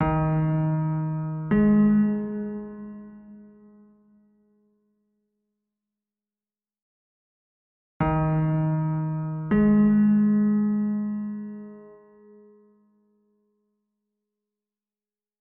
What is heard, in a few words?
Piano